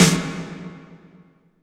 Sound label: IMP SNR DRUM, Drum, Cinematic Impact